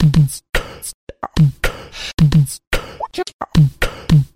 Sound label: doot dootdoot, Beatbox, Drum loops